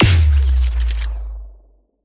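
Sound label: super modern laser weapon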